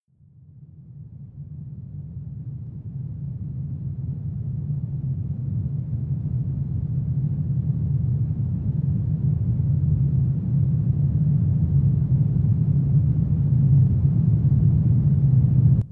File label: rumble; Soulforge Tower Defence